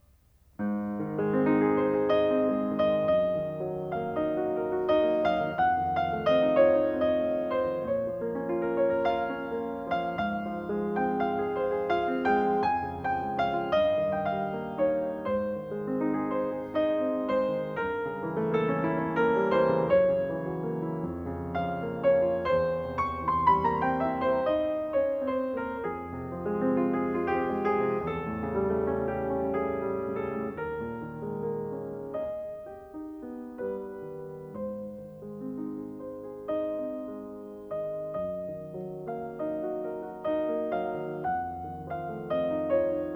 Carnaval for piano; Schumann; Op9; Rubinstein

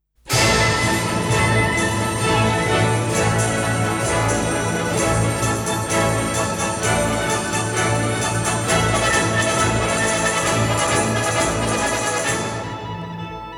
Capriccio espagnol; R Korsakov; Kondrashin

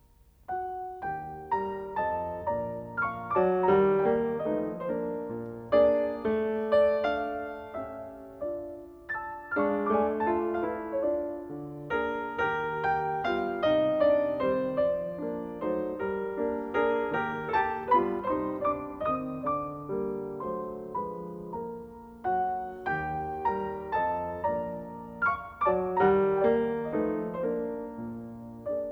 Carnaval for piano; Schumann; Op9; Rubinstein

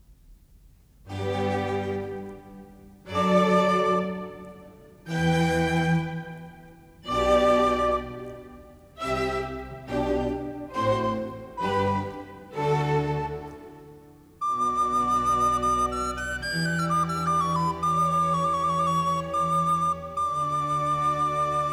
Recorder Concerto; Handel; Op3-3; Marriner

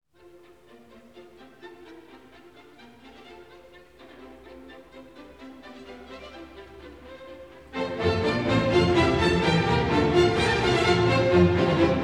Water Music Suite Bouree; Handel; Karajan

